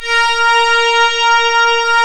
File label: ORGAN, A#; Big Fish Audio; Synclav FM